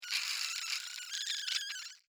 Insect Voice Cry, Insect, Voice, Cry, Pain, Emotion, Vocalization, Bug; Sound Effects:Animals:Insects; Blastwave